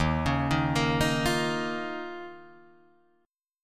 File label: D sharp 7th Suspended 2nd, Guitar, Drop D